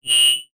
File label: Squelch; NAVTEX